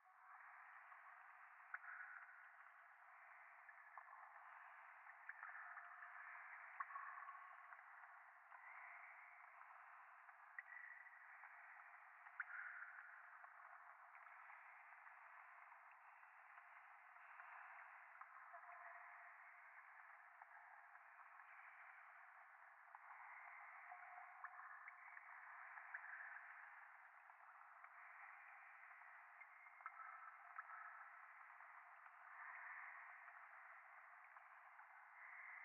ゴースト 右耳密着吐息 雨上がり

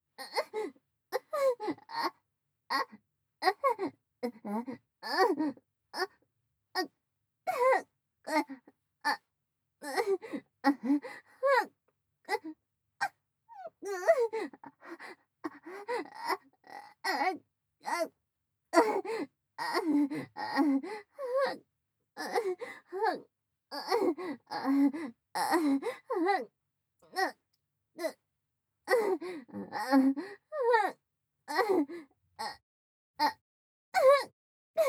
立ちバック; アドリブ集

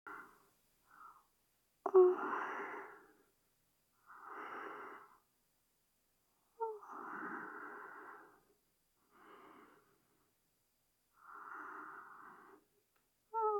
添い寝寝息ループ

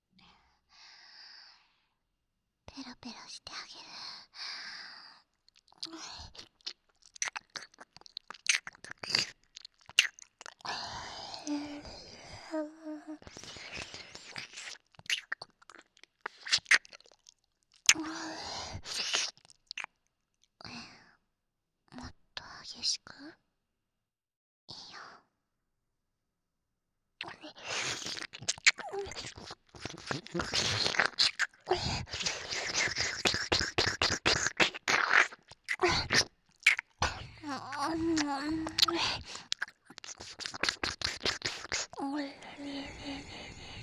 耳舐め, ASMR; SEあり

